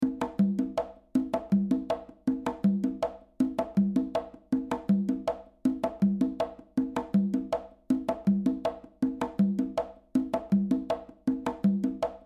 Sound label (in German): reverb loop trocken